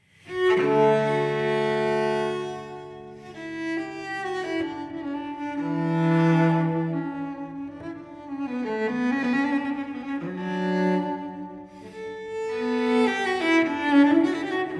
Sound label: Cello Suite No. 6 in D major, Allemande; BWV1012; Johann Sebastian Bach